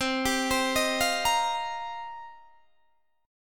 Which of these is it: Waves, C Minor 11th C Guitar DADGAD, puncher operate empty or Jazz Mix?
C Minor 11th C Guitar DADGAD